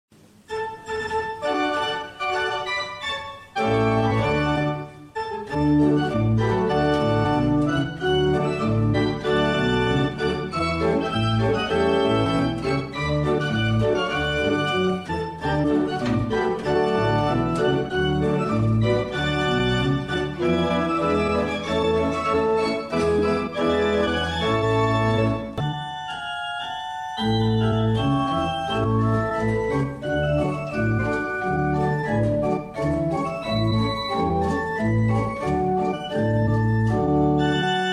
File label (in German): Marsch aus Appenzeller Suite